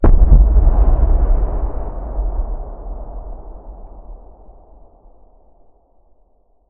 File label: Explosion Gasbag; War Ambience; Prydwen